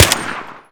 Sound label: ump45 fire; weapons; swb